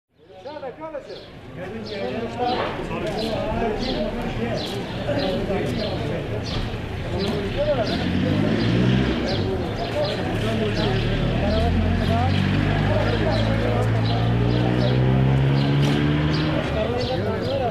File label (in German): Leh Strassengeraeusche